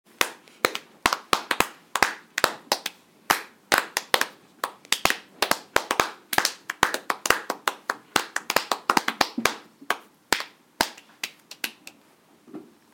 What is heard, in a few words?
clapping piece